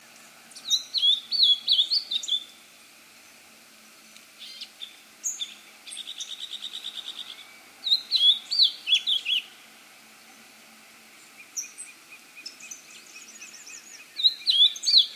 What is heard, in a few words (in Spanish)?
Cyanocompsa brissonii Picogrueso de Brisson